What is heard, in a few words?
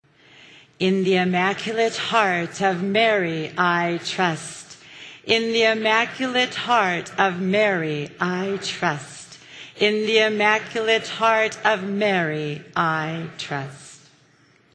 Easter Meditation Ritual Mantra 3x